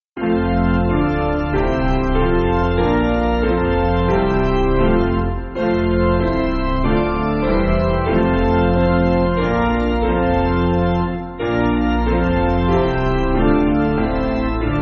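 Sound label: Piano, Free Music Backing Files for Churches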